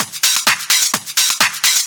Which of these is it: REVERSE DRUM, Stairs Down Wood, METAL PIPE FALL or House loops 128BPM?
House loops 128BPM